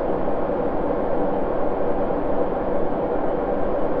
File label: InfiniVerb Noise, sparse soundscape Loops